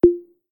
Popup; Newman CM810

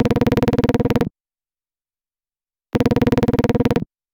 pneumatic, ringtones; Nothing Phone (1)

